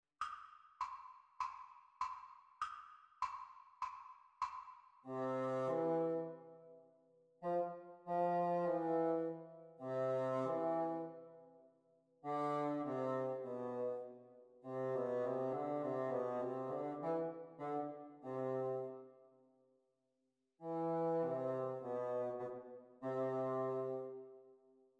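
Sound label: Bassoon Duets; Exam Speed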